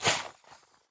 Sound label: xen infest plant bulb; infestation; Half Life Alyx